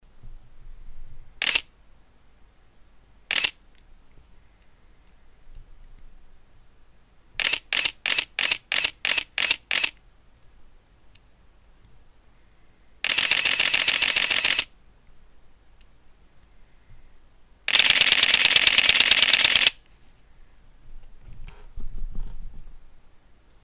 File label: Canon EOS-1V Shutter